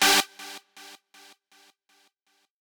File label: lead